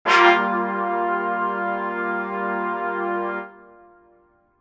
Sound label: trum fp